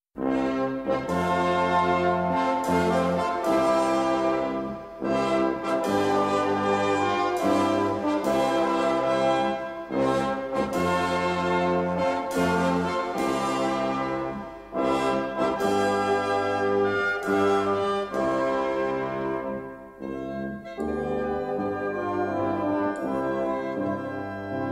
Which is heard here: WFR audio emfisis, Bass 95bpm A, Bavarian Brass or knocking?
Bavarian Brass